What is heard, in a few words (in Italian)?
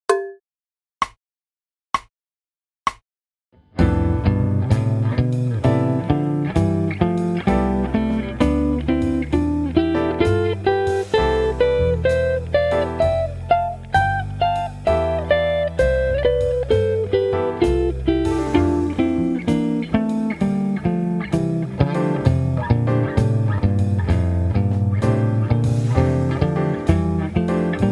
es. major 7 exercise terze contemporaneamente